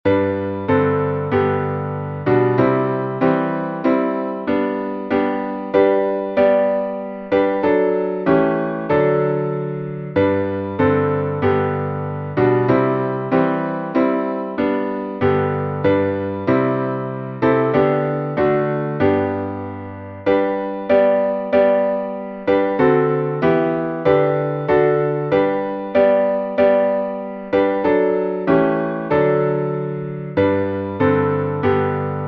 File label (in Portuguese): salmo playback